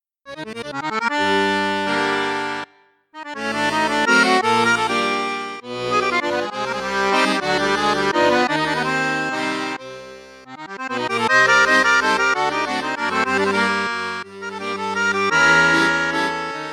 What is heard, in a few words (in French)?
registre basson flute juste, paolo soprani accordeon